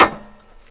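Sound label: short rough low sound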